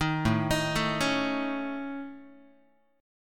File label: A Major Flat 5th, Guitar, AMb5 chord; arpeggiated; JGuitar